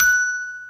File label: NBell F#6